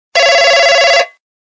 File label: doctor phone